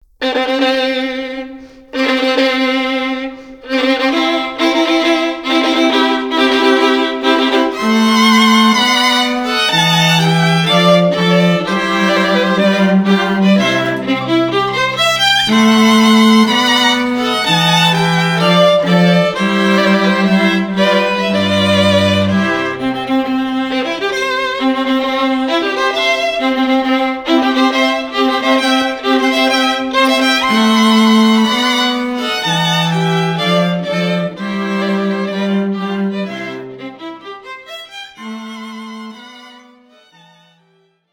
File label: Classical, Classical String Quartet